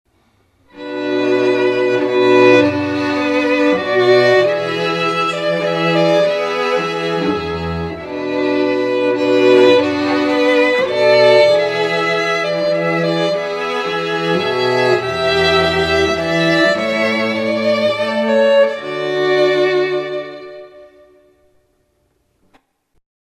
strings nefesh

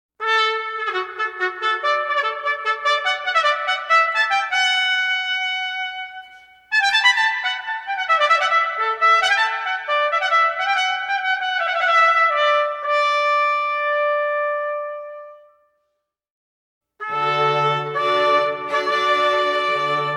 strings fanfare; bridal

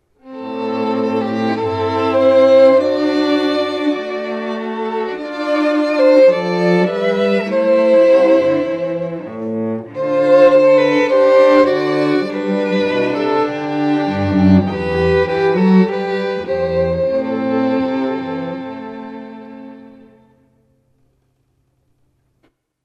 strings shoshanim

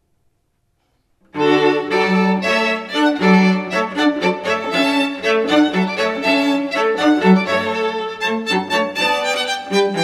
strings hornpipe

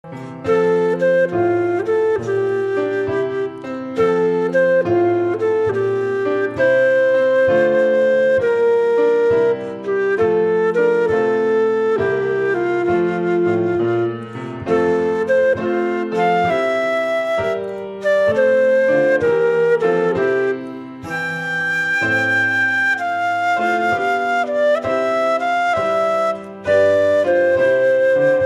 piano flute

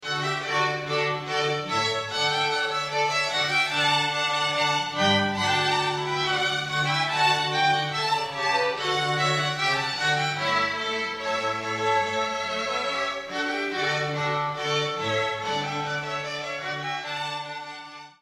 strings rondeau

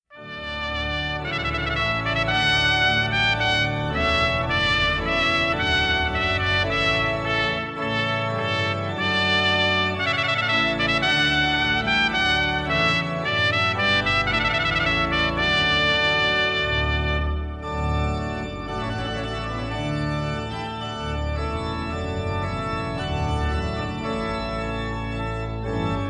tpt voluntary

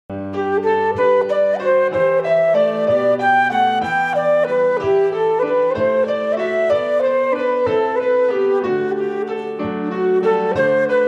piano flute

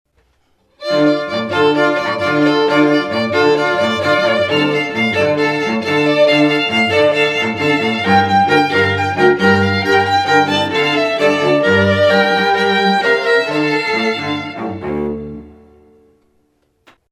strings siman tov

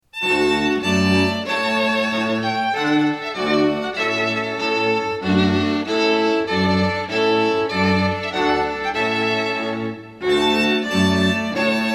strings trumpet tune

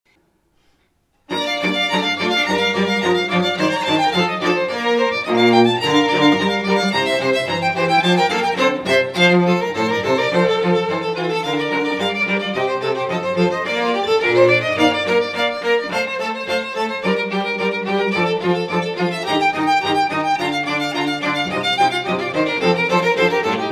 strings sheba

